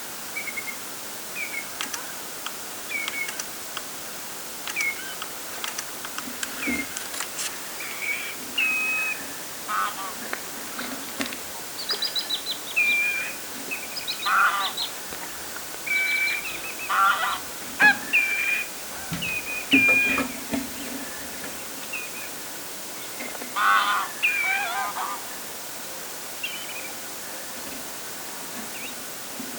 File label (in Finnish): itaisten punajalkaviklojen aantelya rannalla